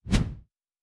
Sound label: Whoosh; Fantasy Sounds Bundle